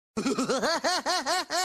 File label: clown laughing; krusty